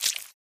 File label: squish